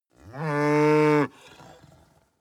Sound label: cow mow single